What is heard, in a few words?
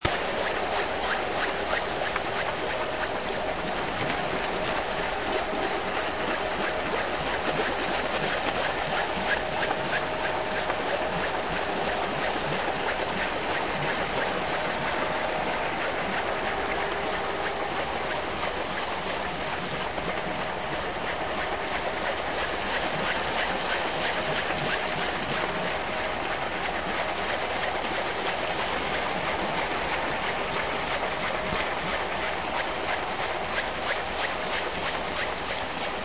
research vessel; noaa